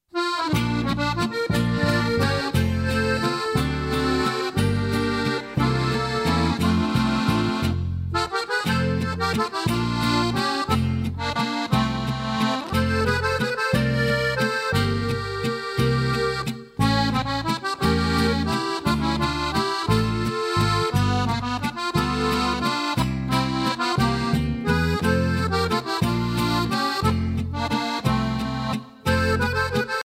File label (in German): Akkordeonspielereien